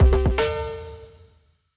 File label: battle end winner